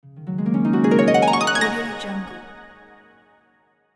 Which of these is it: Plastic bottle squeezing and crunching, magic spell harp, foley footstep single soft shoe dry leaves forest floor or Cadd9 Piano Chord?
magic spell harp